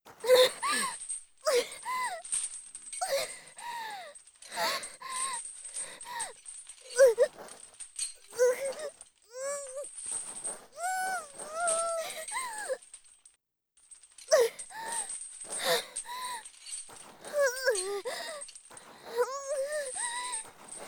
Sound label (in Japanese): 頭を揺さぶる